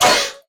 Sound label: hurt; minecraft; mob